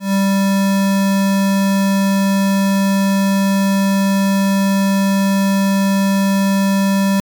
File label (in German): rechteck; harmonie